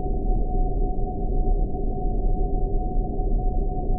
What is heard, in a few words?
InfiniVerb Noise, sparse soundscape Loops